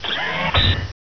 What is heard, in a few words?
gunner walk step left